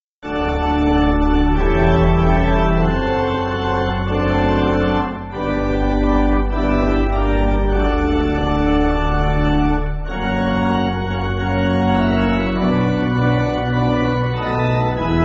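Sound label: Pipe Organ, Music Backing; The Lord is in His holy Temple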